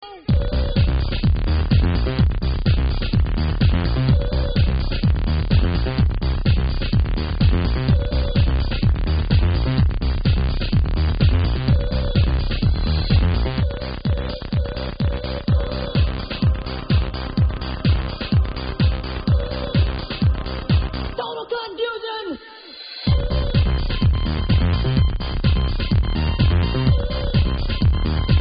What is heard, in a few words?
bass kick